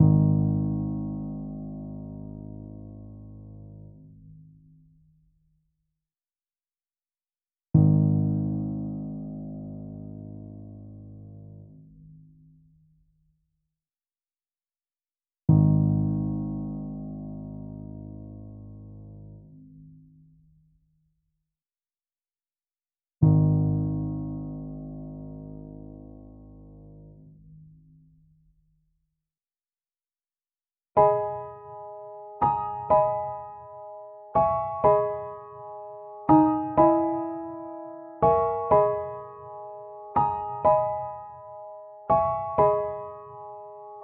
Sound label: 124 BPM G# Min Piano, Loop Stems; Melody; Cymatics; Cymatics - 2022 Melody USB Expansion; Vandal